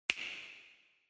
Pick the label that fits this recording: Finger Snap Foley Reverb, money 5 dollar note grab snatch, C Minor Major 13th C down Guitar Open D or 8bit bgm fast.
Finger Snap Foley Reverb